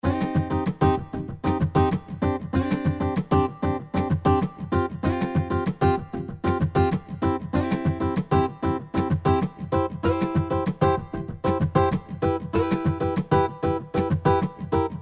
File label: Acoustic Guitar